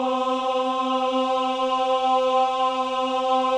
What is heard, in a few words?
AH C4, CHOIR OHS; Spectrasonics; Symphony of Voices